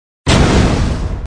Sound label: rush; qiangshou